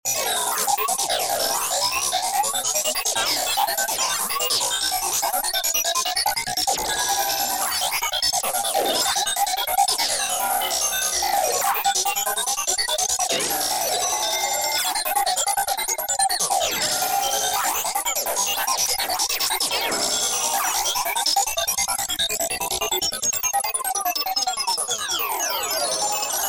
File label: SEQ Blip Blip, goa psytrance; serum2 presets; JLS Fractalis